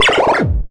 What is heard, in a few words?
SCRATCH, Anime Sound FX; COMIC